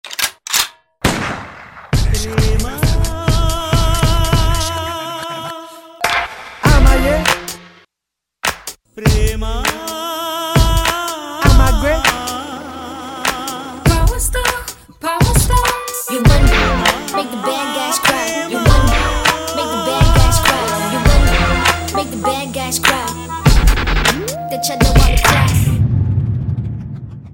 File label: telugu remix